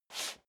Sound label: step leather shoes metal dry slide short; schritte